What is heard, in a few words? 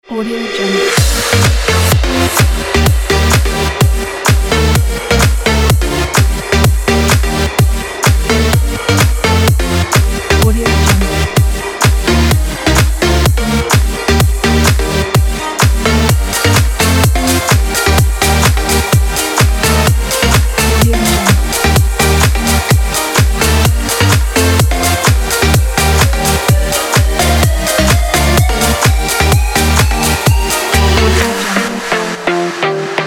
dance party